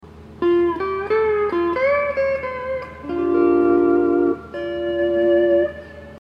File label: hawaiian piece